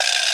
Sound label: Twist It Feedback